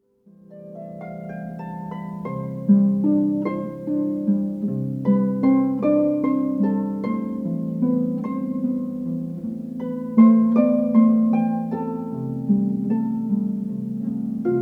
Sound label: Harp; Wedding Ceremony Music